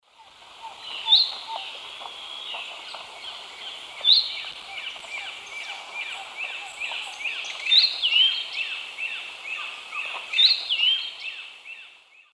Euphonia xanthogaster, call; S. America; Eastern Lowlands

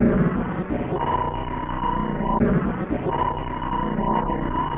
Contact Process, Noise Circuits; Electronica

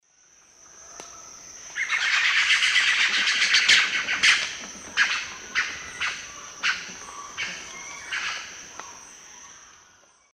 Cacicus haemorrhous, calls group; S. America; Eastern Lowlands

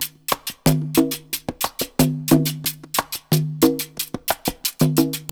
Perc, Percussion Latin

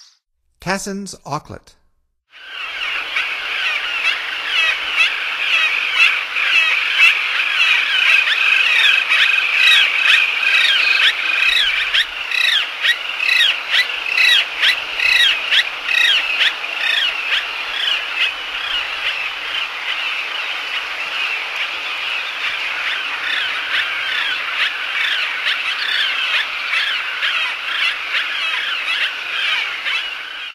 Cassins Auklet; North America; Stokes

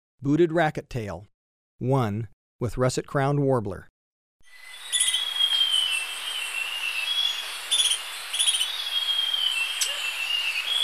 Booted Racket-Tail; S. America

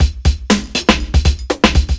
chemical 120, loops, 120bpm

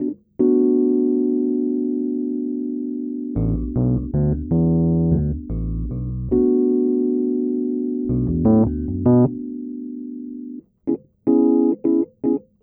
MELLOW JAZZ FUN ELEMENTS

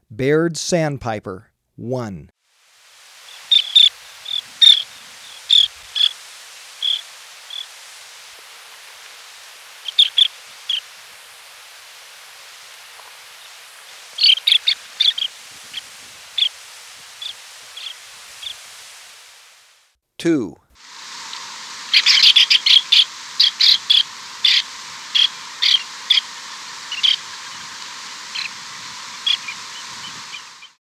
Baird's Sandpiper, Birds of Ecuador; S. America

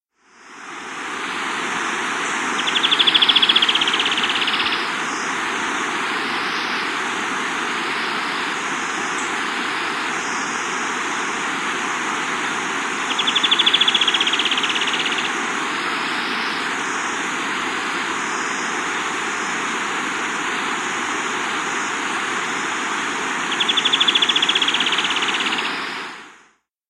Yellow-Breasted Antwren, S. America

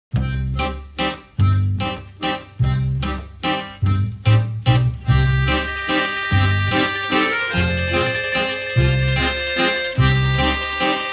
Mazurka

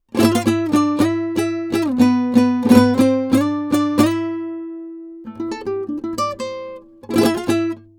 TOTAL SPANISH GUITAR

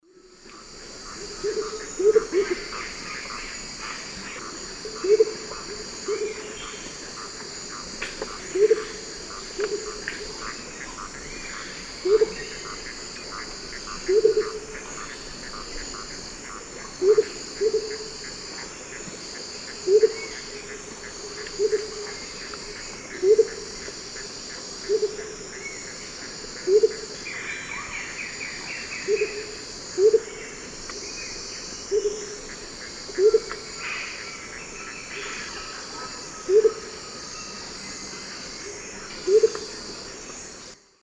Momotus momota, hoot songs 2 birds; S. America; Eastern Lowlands

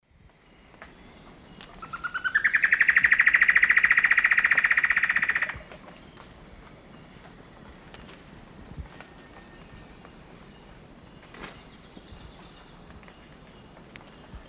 Myrmeciza hyperythra, song and duet; S. America; Eastern Lowlands

